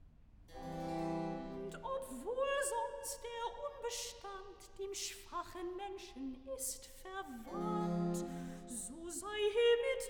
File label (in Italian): Recitativo (Sopran); Bach; London; Feast of St John; Cantata, BWV 30 'Freue dich, erlöste Schar'